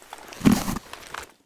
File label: rifle mask use; STALKER; IWP; item usage